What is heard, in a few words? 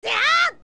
Young Strong Attack; Link's strong attack shouts (spin attack or jump-slash)